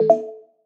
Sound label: new message